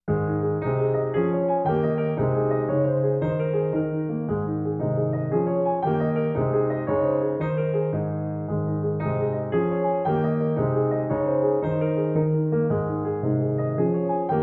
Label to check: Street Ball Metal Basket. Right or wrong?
Wrong